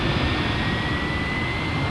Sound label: Take Off; Working Model Program